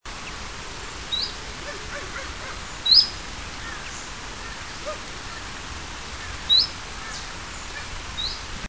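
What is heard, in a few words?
Phylloscopus collybita, Chiffchaff call; Slava Rusa, Dobrogea, Romania 14 October 2000; Linnut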